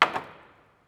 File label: woodhit